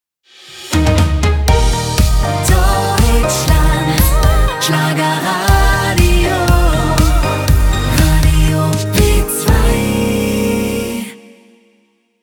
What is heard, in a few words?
radio Jingles; radio B2